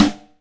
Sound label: Snare, Alesis DM5 Drum Machines